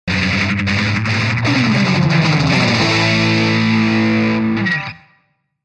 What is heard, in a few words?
Power Cut Guitar Sting